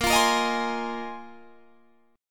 Bb 7th Suspended 2nd Suspended 4th Bb down Guitar Half Step Down; strummed; Bb7sus2sus4